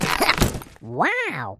penguin fishing; Fishing For Gold

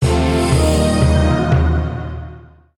win; Book Of Lords

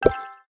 turbo on; Si Xiang

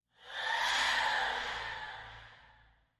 transition; Aztec Gold Megaways

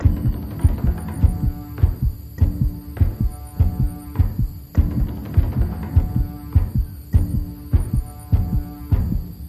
nearmiss tension; The Golden City

